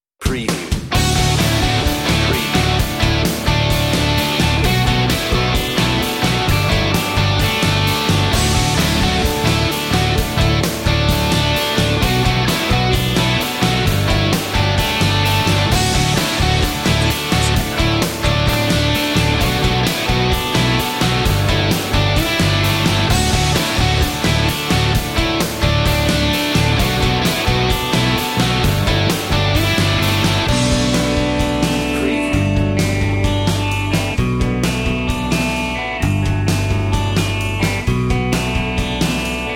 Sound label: Indie Dreams Alt start, on-hold music